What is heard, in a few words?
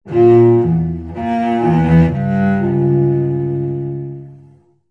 Cello Danger Eerie